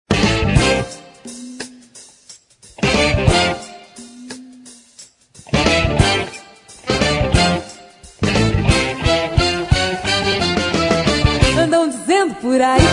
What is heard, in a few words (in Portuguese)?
Samba Rock